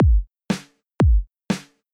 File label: rhythm; Ритм 1; Бас бочка; Малый барабан